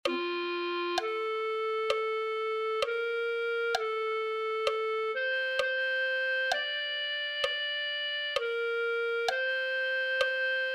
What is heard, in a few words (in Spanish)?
clarinete